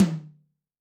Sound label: Room2 Hi, Tom, Yamaha DTXpress-II